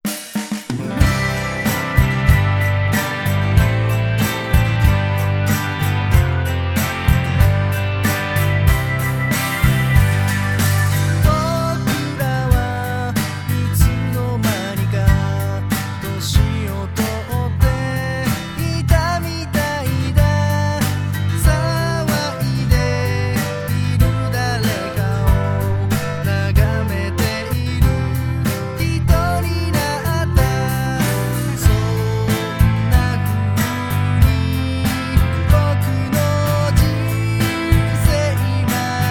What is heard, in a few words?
boost bd